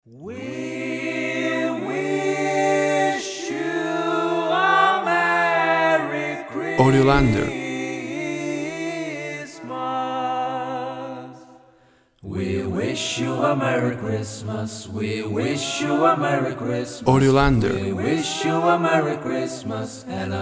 We Wish You A Merry Christmas Acapella Vocal Arrangement